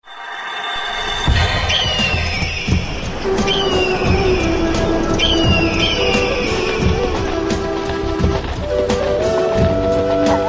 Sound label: ANIMAL REMIX SOUND